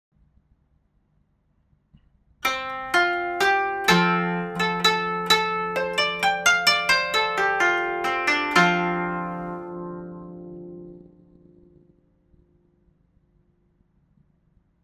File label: koto